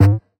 Retro FX; Cymatics; Video Game Sample Pack Vol 1

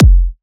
Kick, Techno, One-Shots, Drums

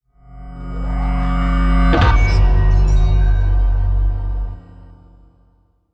Riser

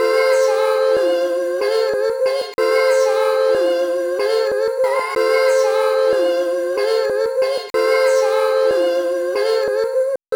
Vocal Chop Church - 93 BPM F Maj; Vocals; Cymatics; ZODIAC Beta Pack

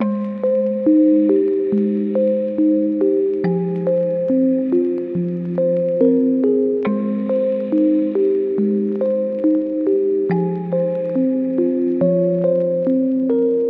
Lofi Synth Melody - 70 BPM G# Maj

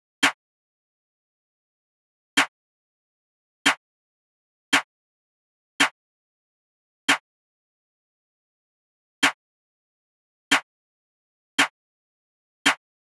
Drum Loop - 140 BPM Snare; Cymatics; Mystery Pack Vol 4; Sturdy Drum Loop

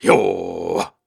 Grunts and Chants, Viking Vocal

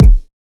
Kick, Dubstep; Dark Matter

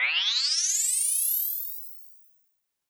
sci fi device; PremiumBeat SFX

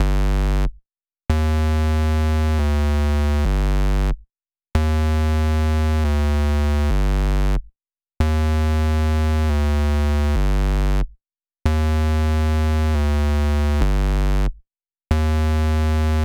139 BPM G Min Bass, Loop Stems; Melody; Cymatics; 2022 Melody USB Expansion; Hemp

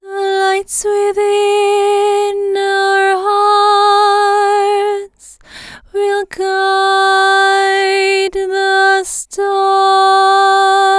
Top Line Harmony Vocals - Fm 80Bpm; Construction Kits; Ghosthack; SkyRealm; Kit 08 - Into The Light

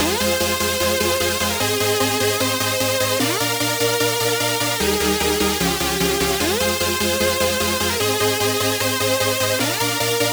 Chord Loop 150 BPM F min, Dubstep; Chord Loops; Synths; Raptor; Cymatics; Dubstep Starter Pack

